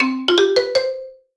Solo Xylophone Positive; startide

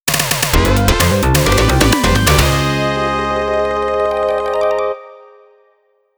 Pass (Game Clear); New Century; Sega Ages 2500 Series Vol.28

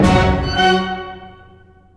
F sharp eighth Oct

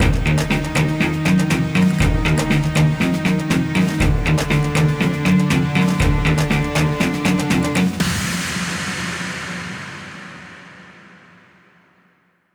Action END Bosque

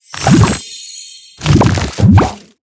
tree growing; talking tom gold run gamerip 2016

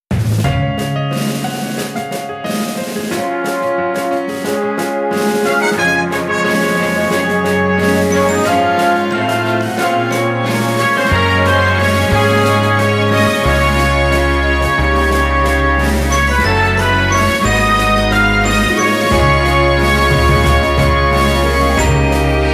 Music Menu event

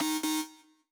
Value Failure

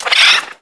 mole; Spanish; water balloon

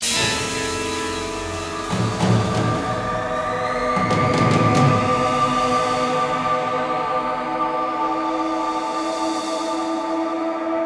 Music; Prison Architect; Succession

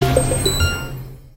multiplier on

